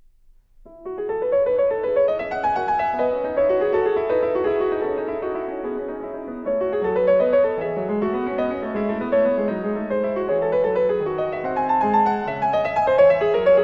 Sinfonia in E Major; Sinfonia No. 6; BWV 792; Bach Inventions & Sinfonias, BWV 772-801

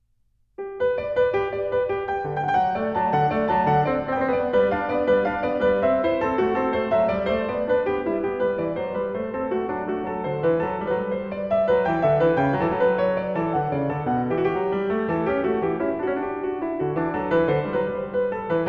Invention No. 10 in G Major; BWV 781; Bach Inventions & Sinfonias, BWV 772-801

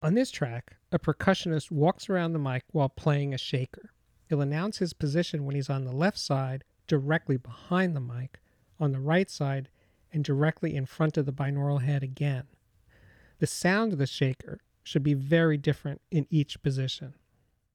Shaker Walk Around the Mic Announcement; The Ultimate Headphone Demonstration Disc ( 24Bit192kHz ); Chesky Record

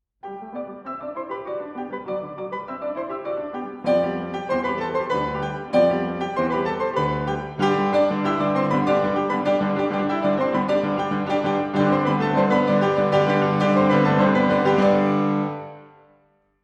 Play my bagpipes, Solo Piano; Tchaikovsky- The Complete Solo Piano Works

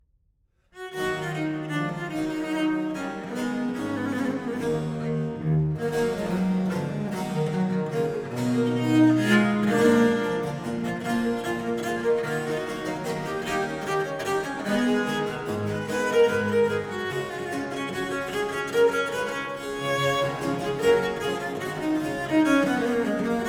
Suite in E Minor, Fantaisie, Viole; La Gracieuse Pièces de Viole; Marin Marais

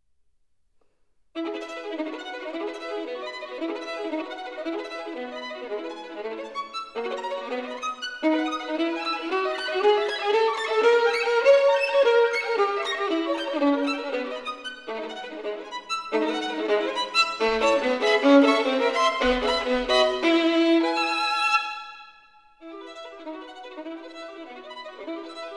Caprices for Solo Violin in E Major; Paganini 24 Caprices

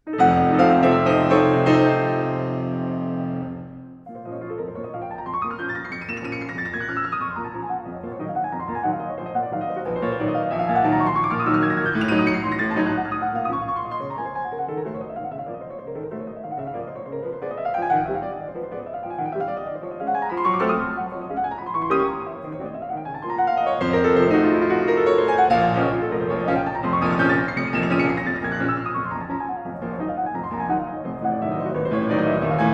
Preludes in B flat minor Presto con fuoco; Frederic Chopin; Twenty-four Preludes, Op 28

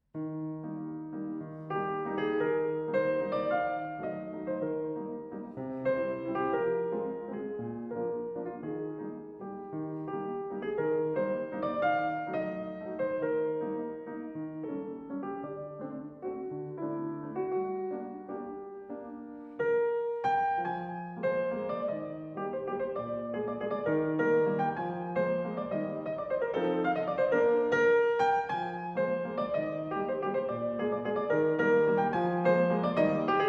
Waltz, Piano; Tchaikovsky- The Complete Solo Piano Works

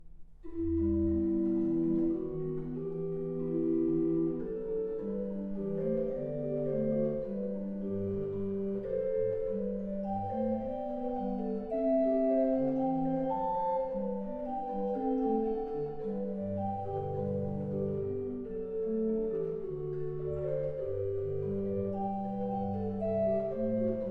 Partita; Ach, was soll ich Sünder machen, BWV 770 - Partita IV; J.S. Bach Chorale Partitas, BWV 766-768 & 770

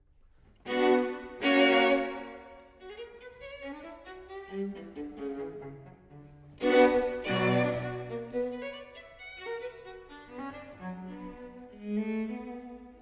String Quartet № 14 cis-moll, Allegro moderato; Beethoven Complete String Quartets; Op. 131 – III.

